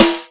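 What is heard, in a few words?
snare, Boss DR-202; Drum Machines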